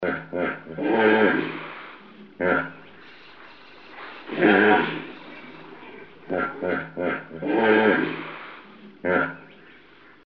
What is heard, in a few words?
Hippopotamus